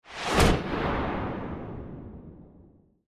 Lightning Crack; soundscrate

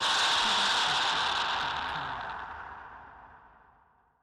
Distorted Scream; SoundsCrate

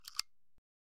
Shotgun Loading; SoundsCrate

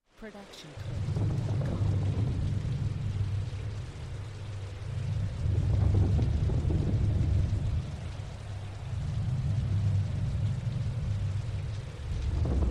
Stormy Rain with Thunder; soundscrate

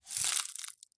Leaves and Twigs Crunching; SoundsCrate

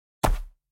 stamp; soundscrate

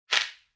footfall outdoor step; soundscrate

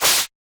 snake attack

